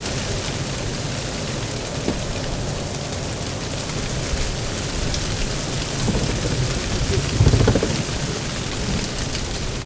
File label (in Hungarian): barlang páran, denevérek; repules; termeszetben